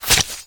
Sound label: Knife Scale, Damage